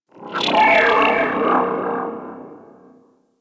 Ship Data Chatter; Ambience; Warframe; Corpus Ship Remaster

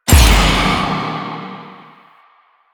Appear; Stalker Specter; Warframe

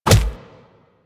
Melee Impact Punch; Enemies; Purgatory Warrior; Warframe

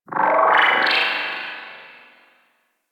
Ship Data Chirps; Ambience; Warframe; Corpus Ship Remaster

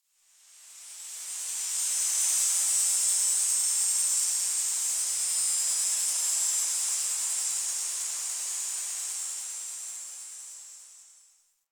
Tree Cicada

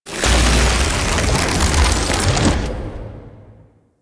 Portal Transition Shattered To Stone

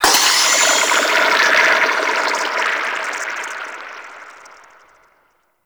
Squid Lancer Ink Blob; Warframe; Grineer

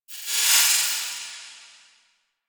Transmission Close; Warframe; Gas City Ropa Fight; Natah

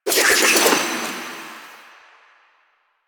Kunai Reload; Warframe; Weapons; Choir

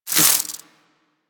Spike Proj Whiz; Enemies; Warframe; Infested Ashen

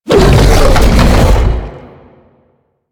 Biped Windup; Warframe; Man In The Wall

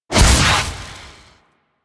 Fire Shot, Flame Thrower; Weapons; Warframe; Ignis Wraith

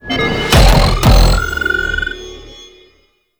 Dropship Wave Defeated Stinger; Tau; Twelve Minute War

